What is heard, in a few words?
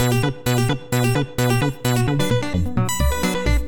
gfs chd machine